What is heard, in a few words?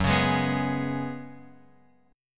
Gb Suspended 2nd Suspended 4th Gb Guitar Open F; Gbsus2sus4 strummed; x,1,1,3,2,3